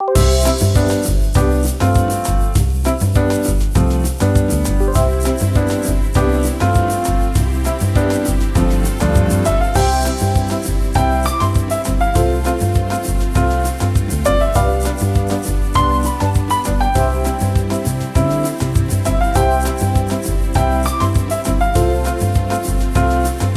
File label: BGM EVE HAPPY; Girls RPG Cinderellife; 3DS